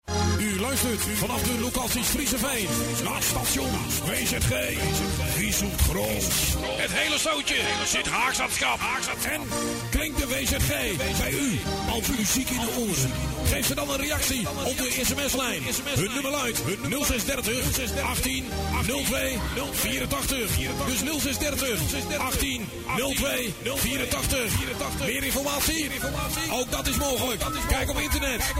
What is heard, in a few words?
Jingle; Firma W.Z.G.; Audio Fragmenten; Arkan Radio 2